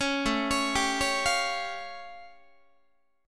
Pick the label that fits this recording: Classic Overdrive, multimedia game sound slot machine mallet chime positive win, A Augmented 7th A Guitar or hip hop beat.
A Augmented 7th A Guitar